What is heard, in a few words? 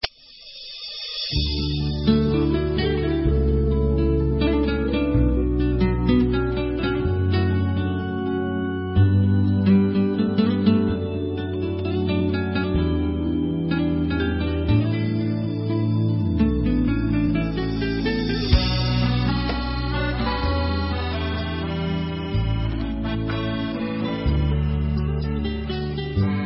Instrumental